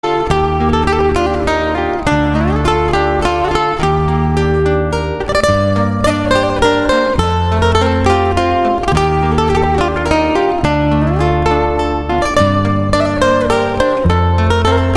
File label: guitar; binbir gece jenerik